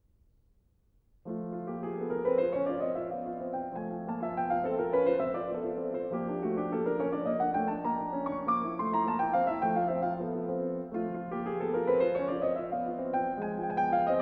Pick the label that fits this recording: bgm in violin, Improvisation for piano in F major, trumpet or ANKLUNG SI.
Improvisation for piano in F major